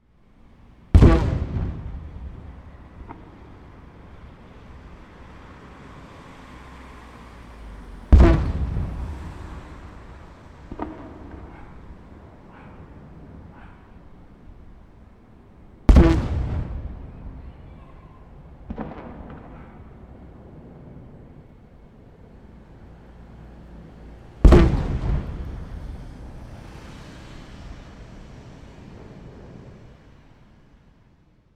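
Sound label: fireworks launch sound and echo; Chino Cultural Complex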